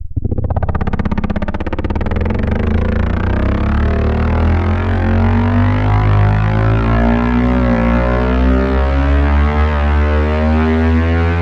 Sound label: Engine Booster; SFX; Routed Sound; Serum 2 Expansion - Quantum Enforcer Vol.1